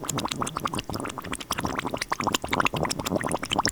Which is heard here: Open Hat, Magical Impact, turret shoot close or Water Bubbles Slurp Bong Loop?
Water Bubbles Slurp Bong Loop